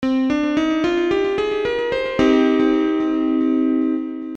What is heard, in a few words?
Nearminor